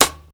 sizzly snare single hit C sharp key; snare drum samples

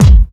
smooth steel kick drum sample b key

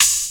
light open hat g sharp key; open hat samples

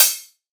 small reverb open high hat sound c sharp key; Bitdepth 24

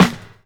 steel snare drum f sharp key

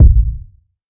ambient kick one shot a sharp key; kick drum samples

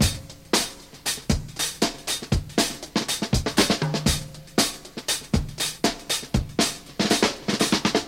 119 bpm fresh drum loop f key

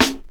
treble heavy hip hop steel snare drum c sharp key; 1990s

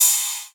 sizzle trap open hat sample a key

